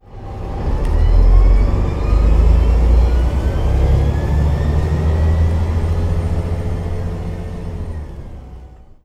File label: Volvo B7TL; Simutrans; Pak128.Britain-Ex